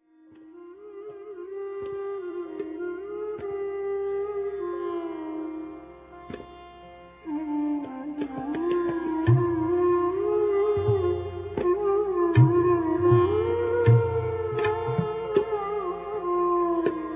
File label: Afternoon melody Raga Bhimapalasi